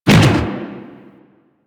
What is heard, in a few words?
Fist Whoosh; DE; Warframe; Weapons; Archwing; Melee; Grn Arch Regor Fists